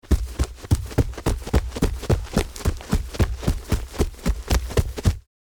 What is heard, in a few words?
Running on dirt surface pass by fast